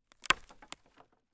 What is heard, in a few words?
impact large branch snap with foot